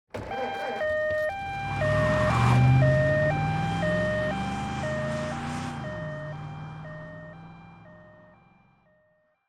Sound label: siren leaving; mystery-island